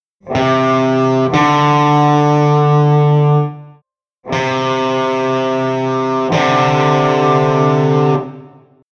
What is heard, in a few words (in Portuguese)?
Intervalo de Segunda Maior